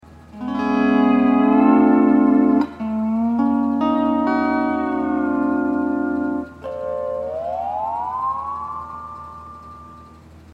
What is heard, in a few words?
hawaiian piece